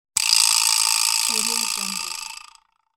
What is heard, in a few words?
vibraslap single hit